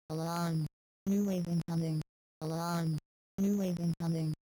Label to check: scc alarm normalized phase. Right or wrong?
Right